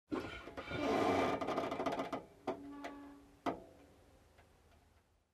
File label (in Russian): ruchnik avtobusa